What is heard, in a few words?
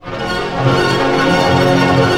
ARP C MAJ PP, STR ARP; Sound On Sound; Peter Siedleczek's Orchestra